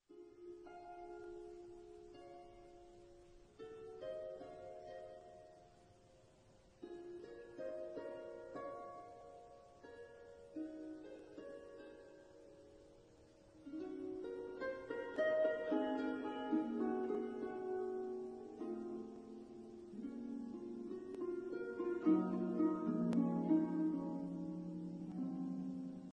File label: harp orchestra ringtone; claire de lune